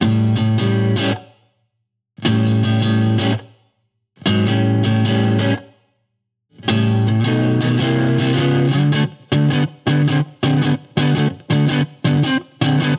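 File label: Blues Guitar Breakdown; Digidesign; Pro Tools Essential Loops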